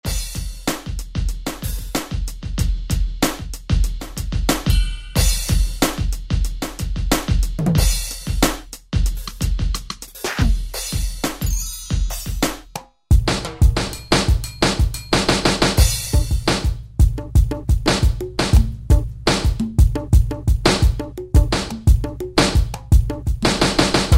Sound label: Funk Acid Jazz, Roland SR-JV80-12 Hip Hop Collection